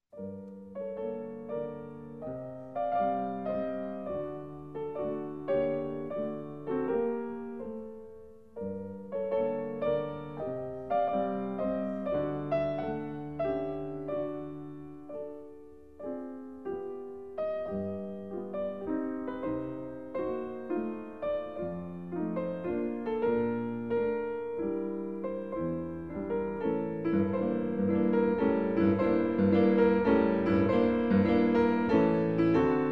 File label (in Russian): bagatel dlya fortepiano v sol minor; monyushko stanislav